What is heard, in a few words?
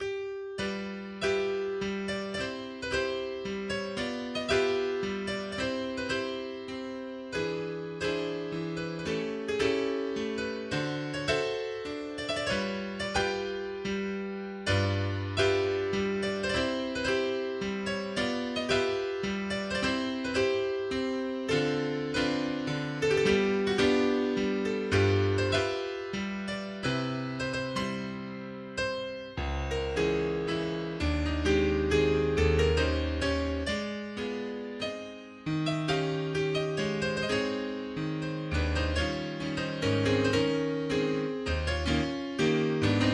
Mazurka No24 in C Major Opus 33 No3 Semplice